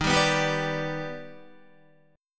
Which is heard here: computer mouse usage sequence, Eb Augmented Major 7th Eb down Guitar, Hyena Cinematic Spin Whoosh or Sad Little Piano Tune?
Eb Augmented Major 7th Eb down Guitar